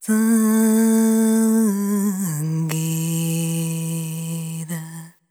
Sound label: ETHNO FEMALE, Vocals; ethno2